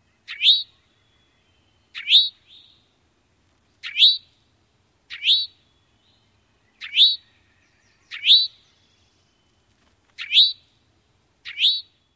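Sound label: Eastern Towhee nasal calls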